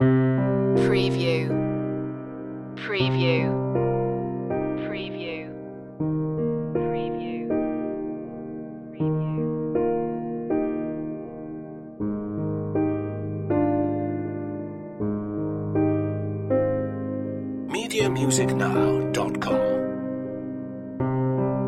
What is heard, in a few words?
the saddest ballad